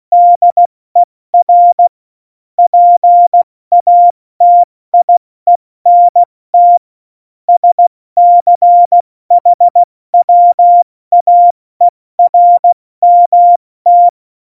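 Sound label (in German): Witz, Morsekurs, cw morsen; Witze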